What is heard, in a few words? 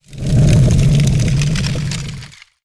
icewave cast